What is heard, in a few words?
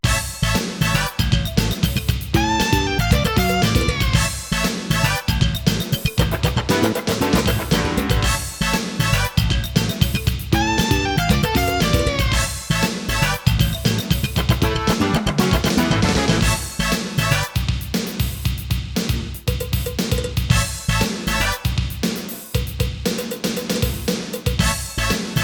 SC-55mkII; Overtake; TRAFFIC JAM